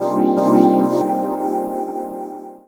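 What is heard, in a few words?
Pad Synth; Equipped Music; Loungin House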